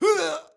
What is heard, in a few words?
Male Death Cry